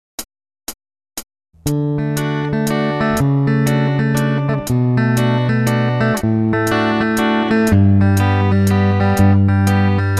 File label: Rhythm Guitar